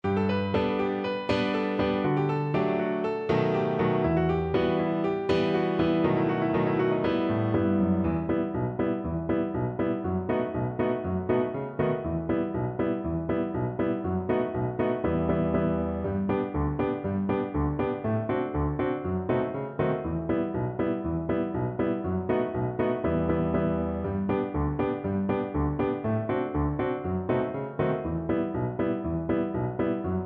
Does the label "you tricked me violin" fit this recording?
Yes